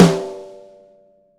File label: DRY SN, STUDIO DRY SNARE